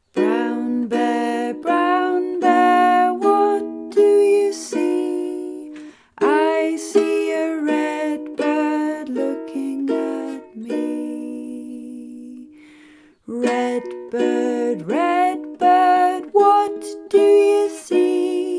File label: Singing the Story